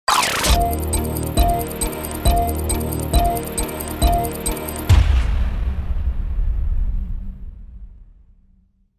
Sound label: Time's Up Groove, DJ sounds; Mtrax